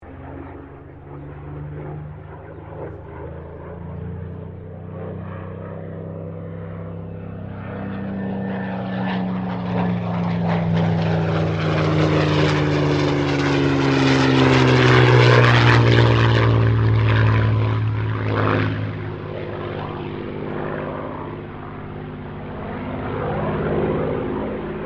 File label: AIRCRAFT SUPERMARINE SPITFIRE MK-XVI PASS; Airplanes